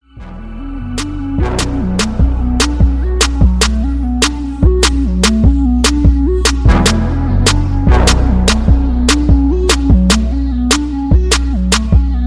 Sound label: Gangsta Beat 72